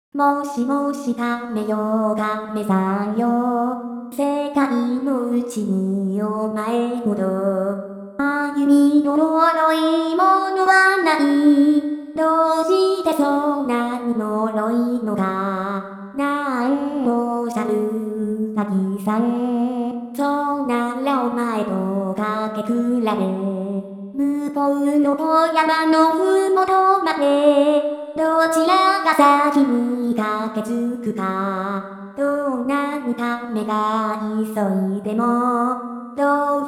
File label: rabit and turtle voice